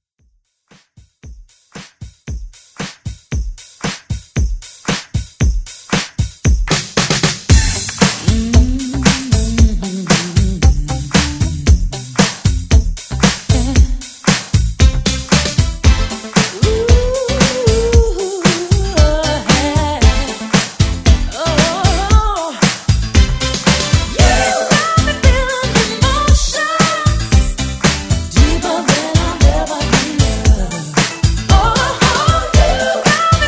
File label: 90s Pop Redrum